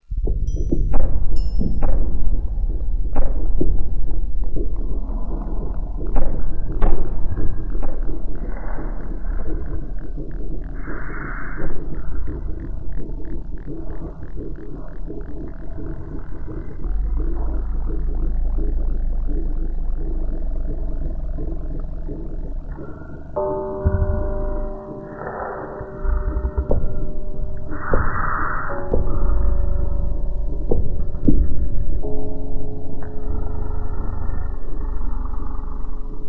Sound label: Pulsating Ambience